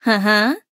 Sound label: Nonverbal Encourage; Aurora